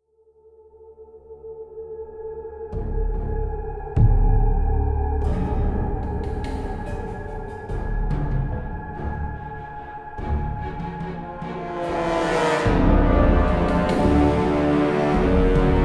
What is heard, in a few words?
Waltz; Star Trek: Legacy